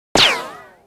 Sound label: ricochet; gesource; physics; impacts; ricochets